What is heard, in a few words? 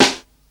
fresh crunchy snare one shot c key, steel snare drum sample tuned to the C note